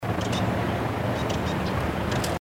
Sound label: White rumped Munia